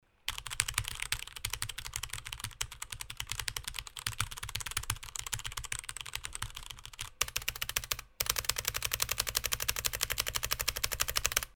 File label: Strong, keyboard; hyperx alloy elite keyboard; review hyperx alloy elite keyboard